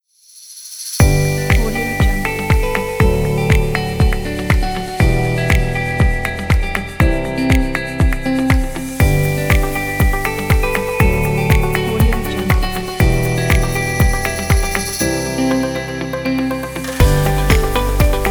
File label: advertising background music